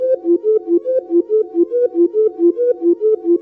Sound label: adrenaline flute; MELODIC LOOPS; WORLD LOOPS